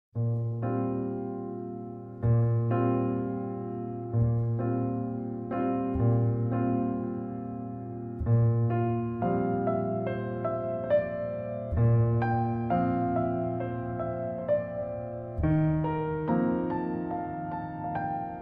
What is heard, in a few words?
piano